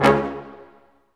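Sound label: BRS Large Ensemble stac; Roland L-CD702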